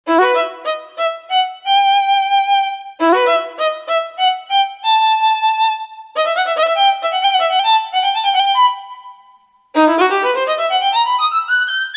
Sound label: violin vibrato